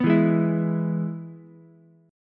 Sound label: E-Major E, Ukulele; strummed; JGuitar